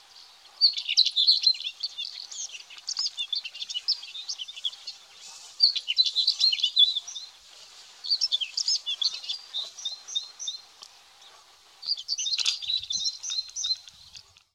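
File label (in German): Steinschmätzer; Oenanthe oenanthe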